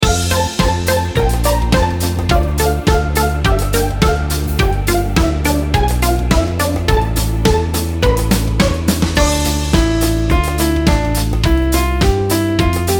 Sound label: karaoke